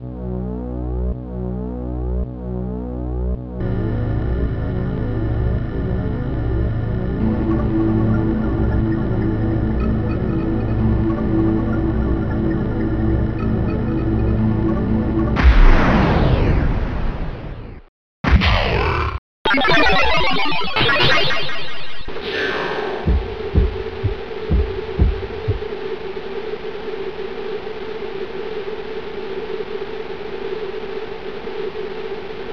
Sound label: Power